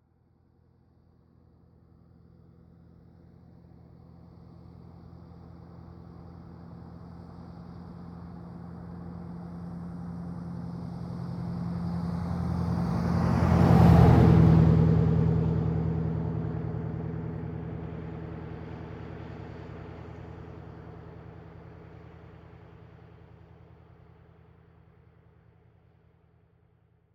Jeep Grand Cherokee WJ 4.7 exterior wet asphalt passby 50kmph low rpm static; Cars In Motion